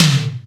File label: TOM, KIT Dance Kit, Roland